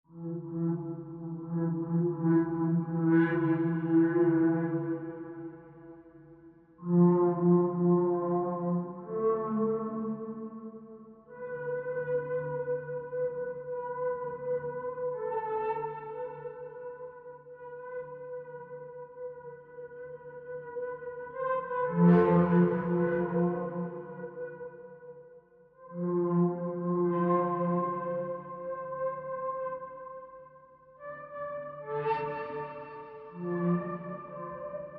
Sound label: Synth Only